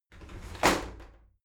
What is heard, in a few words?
shower slide doors open heavy; objekte; tueren